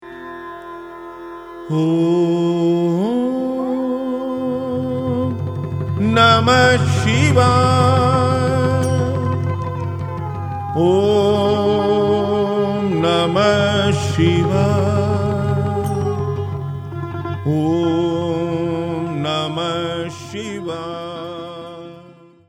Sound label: om namo shivaya; kathak